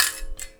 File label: Finger Hit, Prepared Kit; Battery 3 Sample Kits